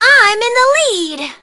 lead; Brawl Stars

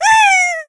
die; Brawl Stars

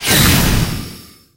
gadget; emz; Brawl Stars

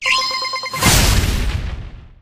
ulti beacon; ruff; Brawl Stars

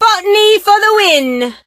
lead; rosa; Brawl Stars

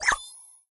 get pp accent; Brawl Stars

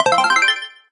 ulti ready; Brawl Stars

